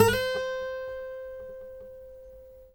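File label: trill, Guitar; Quantum Leap; Guitar & Bass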